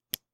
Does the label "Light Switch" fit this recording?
Yes